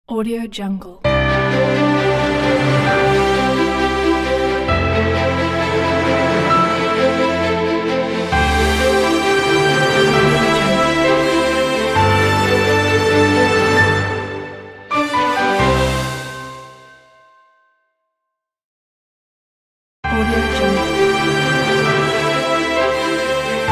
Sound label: cinematic intro logo